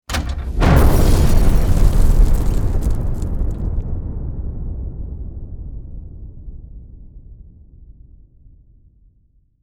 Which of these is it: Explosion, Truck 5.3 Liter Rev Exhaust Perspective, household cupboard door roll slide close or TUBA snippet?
Explosion